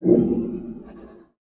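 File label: Mech Fidget Vox; Sid Meier's Civilization 4 Complete; Beyond the Sword; Units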